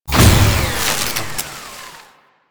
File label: Energy Ribbon Shutdown; Warframe; Veilbreaker; Quests; Kahl